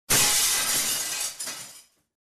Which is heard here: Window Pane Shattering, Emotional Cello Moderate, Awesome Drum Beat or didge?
Window Pane Shattering